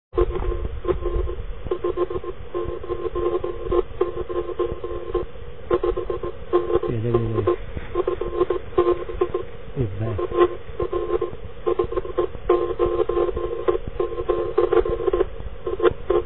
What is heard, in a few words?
laser; om3kii